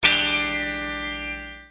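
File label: guitar chord vibrating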